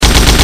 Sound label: fire, sentry gun; cstrike; CSSB